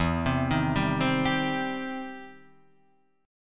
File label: E Minor Major 7th Sharp 5th, E, down; EmM7#5 arpeggiated